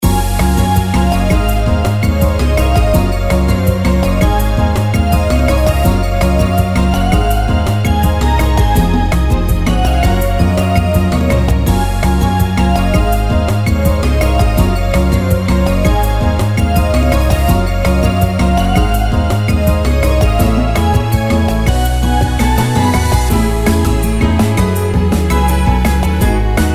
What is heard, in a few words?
Raid; Mist Train Girls